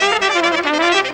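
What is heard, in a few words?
clarinet trumpet; Best Service; World Colours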